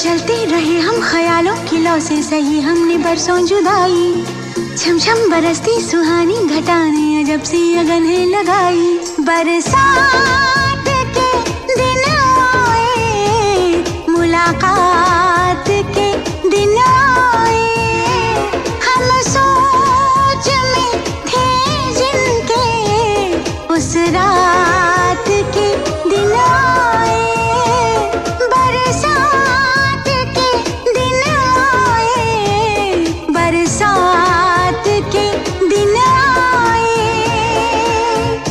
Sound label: Song Ringtone Female Version